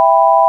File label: sine; 音の波形と分析